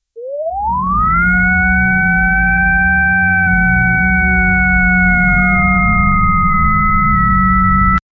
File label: defibrillator sound, medical equipment